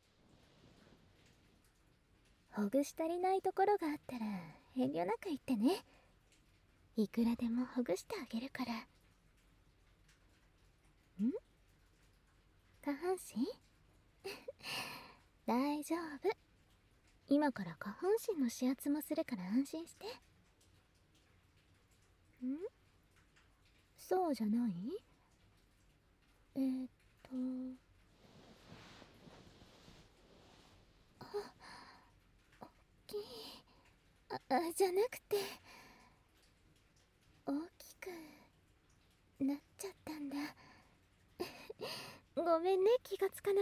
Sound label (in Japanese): 耳舐めオイル手コキマッサージ, ASMR; 効果音同時収録と効果音ロケで"本当に田舎で過ごす"を感じられるASMR生活～田舎でお姉さんに身も心も甘やかされて全部がどうでもよくなるまで気持ちよくなる～